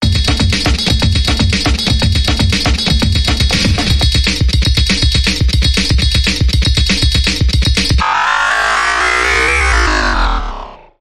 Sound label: Mechanoid Dance Bumper Reversed